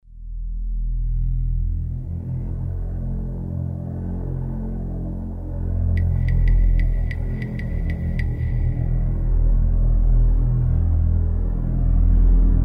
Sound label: Trance